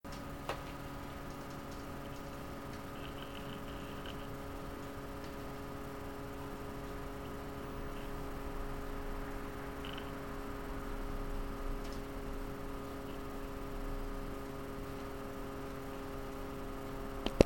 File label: HD 6850 Reference idle; XFX